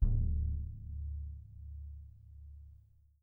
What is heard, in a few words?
BD PP, Percussion; Miroslav Vitous - Percussion & Harp